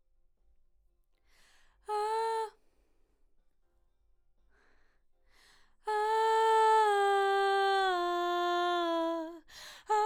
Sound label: BG Vox